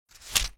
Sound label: page flip